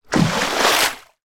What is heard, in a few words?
water movement fast; sound effects one